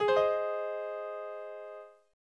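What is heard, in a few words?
A flat major, piano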